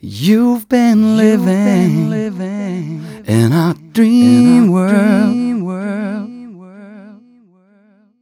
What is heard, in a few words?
m 129 mel e, Vocals